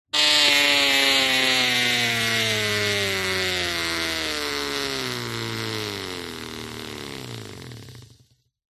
bicycle pushbike wheel tyre spin with paper or other object rubbing; vehicles; slows; zapsplat